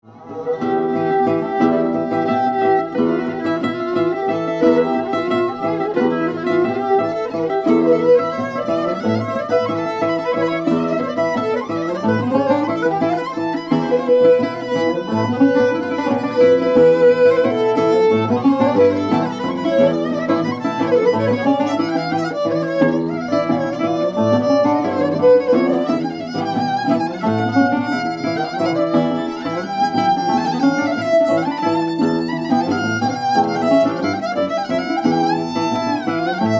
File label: violin; oushak